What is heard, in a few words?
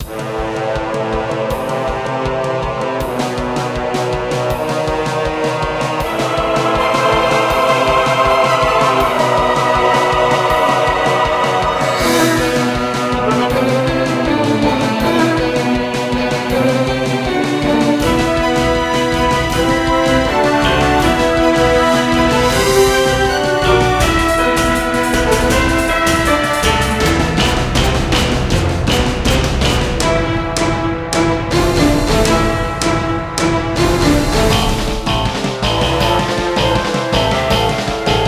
Battle Tune; epic quest of the 4 crystals